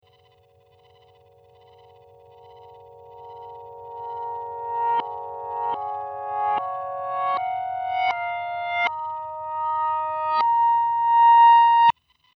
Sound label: backwards rhodes